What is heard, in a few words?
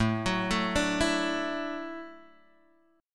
D-Major Add 9th A down Guitar Standard; arpeggiated; JGuitar